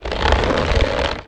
Book Large Old Open; SFX Kit; Foley - Misc

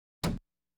Automobiles various

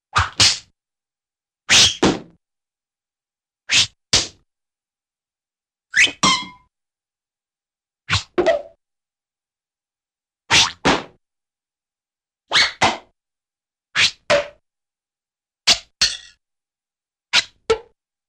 Various Swishes & Impacts; Cartoon; Hollywood Edge; Cartoon Trax

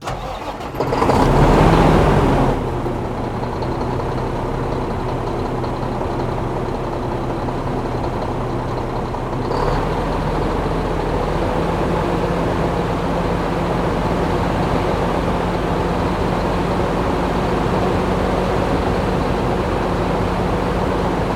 Semi Truck Start; Large Vehicles; Blastwave

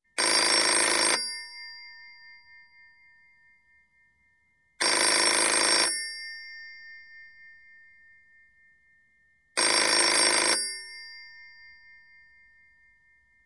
Telephone, European Ringing, Foreign, Office; Sound Ideas; Series 2000

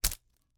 slingshot, dry firing, slap, rubber, rustle, shot; WristRocketPro; BlackMamba bands; Eiravaein Works; Vaeyan IV

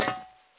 fire escape; blindside

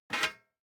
DICE on Metal, Grab, Standard, 3 Three Dice; Samples; ArticulatedSounds; DICE Sfx Party (Extended)

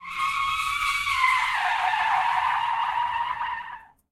skid asphalt; Blind Drive

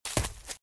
Footsteps - Leaves - Run - Left; RadwinIsland

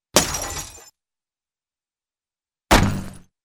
Glass Bottle Impact & Shatter, Cartoon; Hollywood Edge; Cartoon Trax

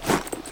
Rustle Human Jump Gear; SFX Kit; Foley - Rustle

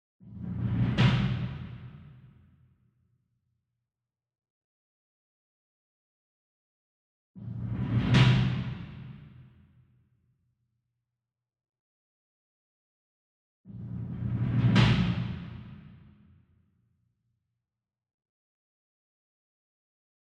Bass Drum Marching Roll Soft Beater Far; SINGLE DRUM; boom library; Cinematic Strikes Construction Kit

